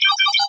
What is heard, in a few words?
alert; Cosmic Rage; ships; computer